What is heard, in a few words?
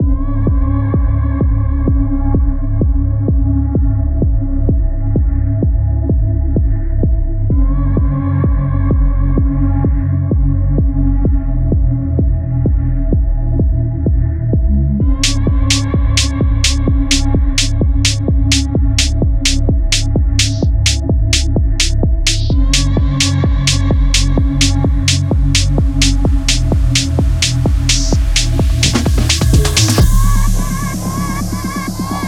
Ambience; Mesteks